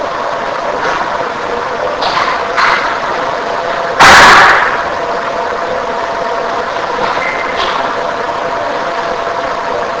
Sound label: 2nd Floor Kids Room Unexplained noises and loud; Truner Home Bluefield WV; bang